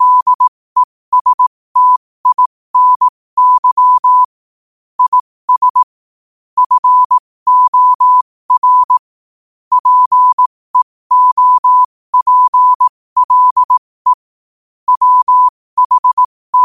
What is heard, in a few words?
5 wpm, morse